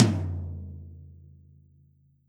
Tom Stick Hit Hard Dry Session; Drums

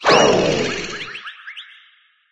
hovercraft celebration; There; ThereClient

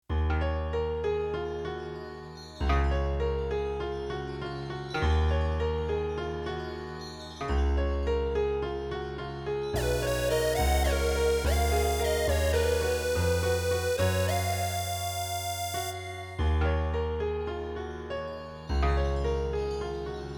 Piano

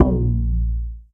Tom Analog; Drums

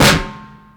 Snare; Ableton Live 12 Lite Core Library

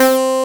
Infected Bass C4, Synths; Multisamples; Ableton Live 12 Lite; Core Library

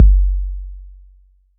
Kick 808 Tone

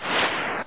fireball; Open Zelda; Quest Designer 2.0.4b

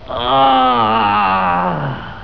got hit; Digital Paintball 2

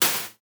Hihat Open

